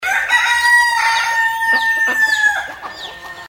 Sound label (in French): coq